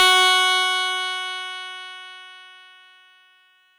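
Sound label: Fuzz Clav DX100 F#0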